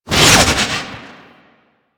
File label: Concrete Archgun Projectile By; Warframe; Archwing